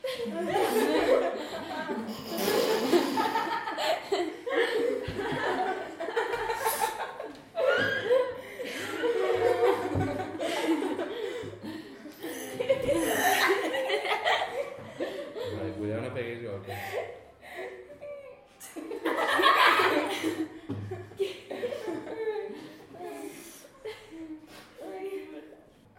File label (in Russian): devochki smeyutsya